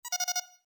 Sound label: Beep; Star Trek